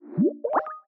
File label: File Transfer Complete; Skype Sounds